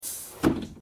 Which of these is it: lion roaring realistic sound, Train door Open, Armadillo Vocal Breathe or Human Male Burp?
Train door Open